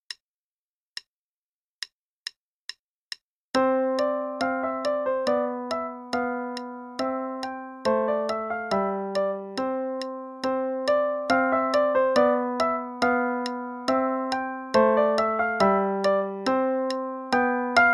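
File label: Play-a-long, Allegro, quarter note=140; Reinagle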